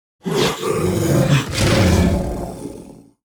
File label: worm idle; LoL; monsters